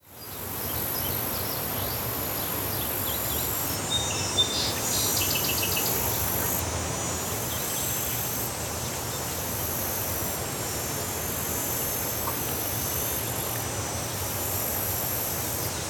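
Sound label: Mainz Farm ambience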